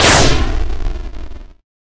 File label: Cannon